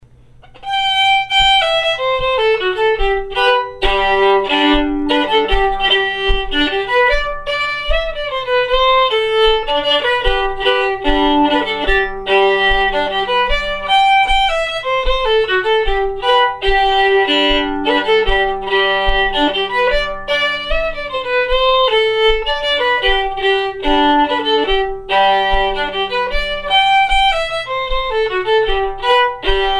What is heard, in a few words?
Schottis, fiddle